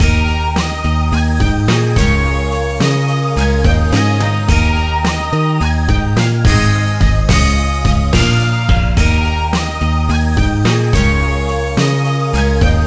victory jingle

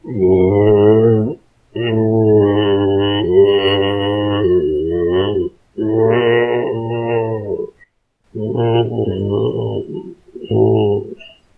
Ball move

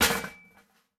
Ventil stack; miside demo windows gamerip 2023

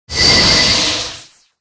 Btl Hitlrng Energyball; The Denpa Men; Android

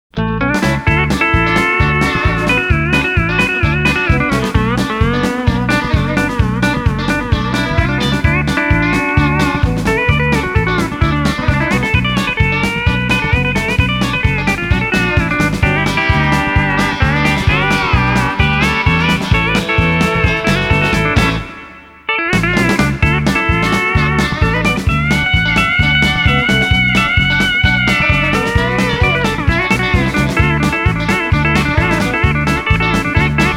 pedal steel step

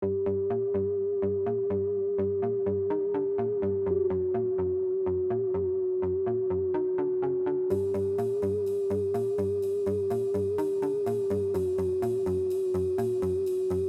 bpm125; Zombie Rollerz; Pinball Heroes

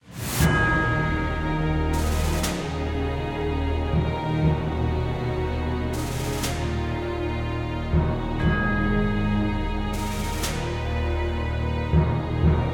Humans Defeat; Disciples III Reincarnation

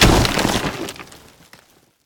icehit break; Nut Boarding

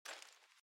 armour Light Step

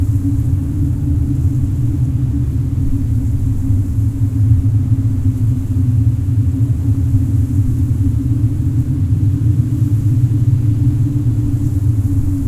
wind, Ice Cliffs

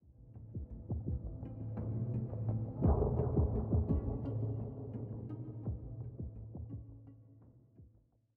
85bpm; Legends of Pegasus; windows gamerip 2012

